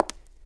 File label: Foot; Magrite; Gamigo